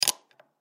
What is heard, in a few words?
Stable Lock Gate, Stable Lock Gate Farm; Foley; Barn Doors; Blastwave